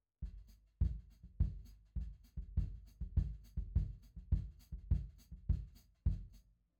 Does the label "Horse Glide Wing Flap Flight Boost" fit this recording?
No